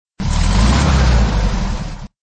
Revs, cars; disney; Fillmore

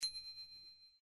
bell hi; snow white

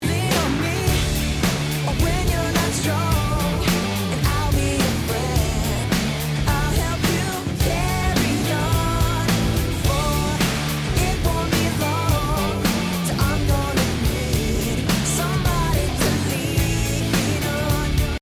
music video loop

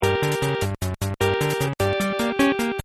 song loop; Wreck-It Ralph

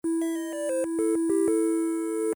game lose; toystory; mr potatohead

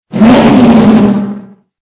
Rev Short, cars; Chick

